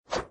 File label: jump